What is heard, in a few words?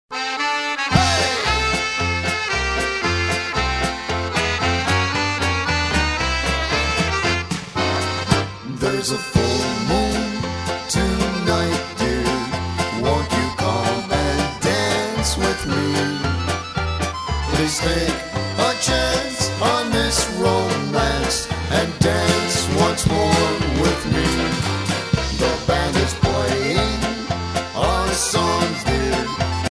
Medley